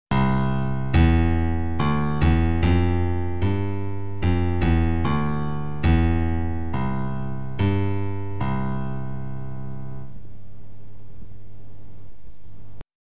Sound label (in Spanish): Sonidos graves